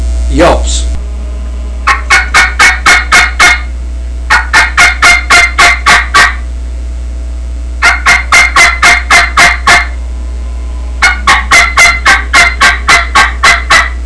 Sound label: cutter yelps, turkey; mt top; Southland Sounds